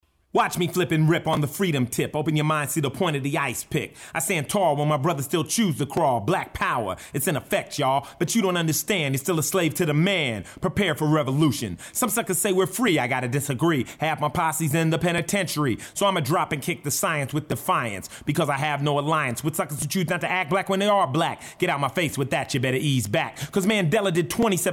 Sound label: Rap & Hip Hop